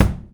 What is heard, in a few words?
old school crisp kick drum single hit g key